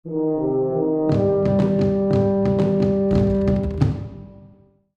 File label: Empire game triumph success